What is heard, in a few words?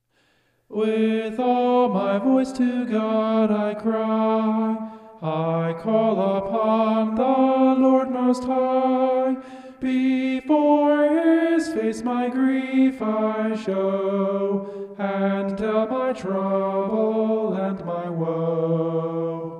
vocal homoph; psalm 142